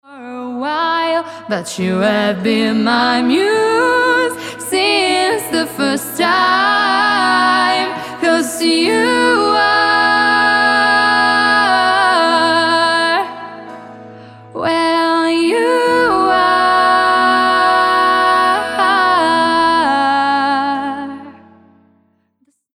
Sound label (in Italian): VOCI